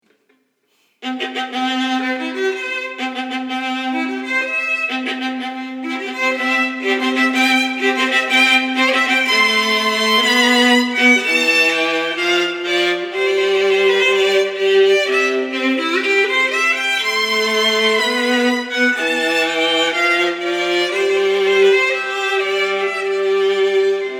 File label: wedding march